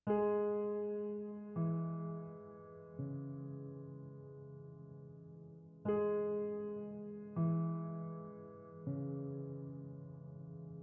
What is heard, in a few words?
Orchestral